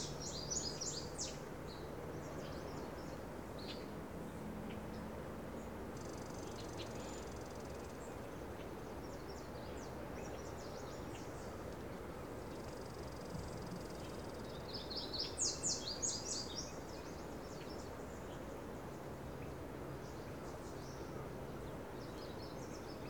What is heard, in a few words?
ambience day; ott3d